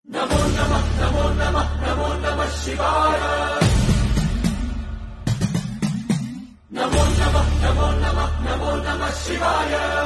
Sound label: Ringtone